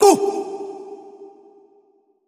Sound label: Predator Hunting Call